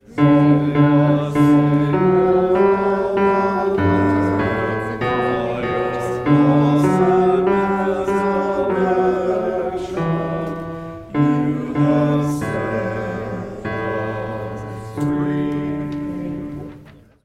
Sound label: bass